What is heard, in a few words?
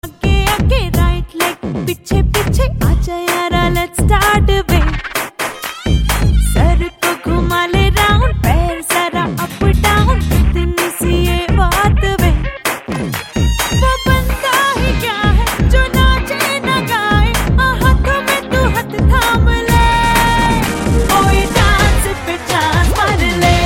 R N B D J